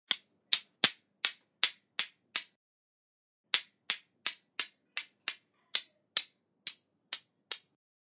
Vysekanie iskry iz kamnei

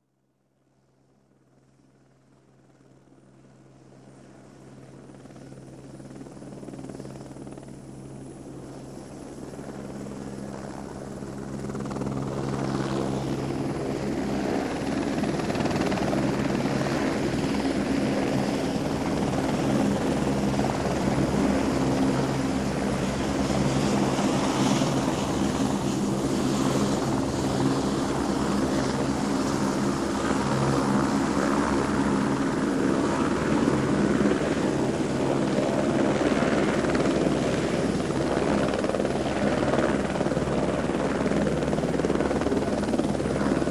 Posadka vertolet prizemlyaetsya

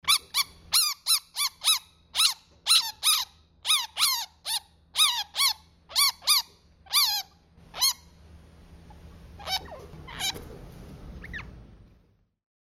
SHinshilla

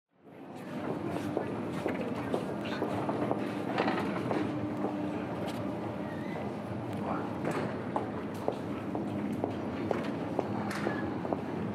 Vestibjul metro

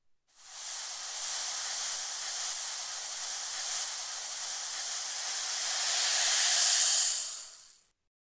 Pesok sypetsya

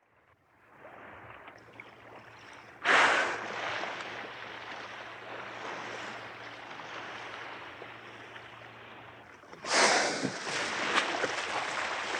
Kit puskaet fontany

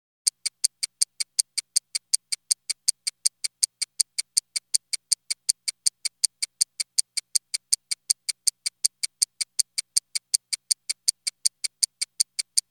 Sekundomer

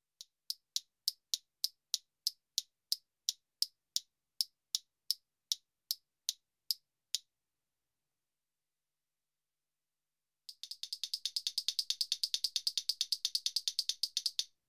Ruchkoi schelkajut

